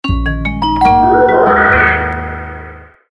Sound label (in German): Sound KLINGEL; Klingelton; mainzelmaennchen